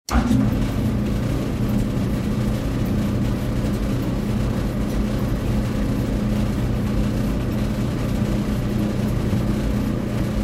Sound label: Washing machine in the laundry room